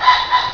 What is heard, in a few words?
pheasant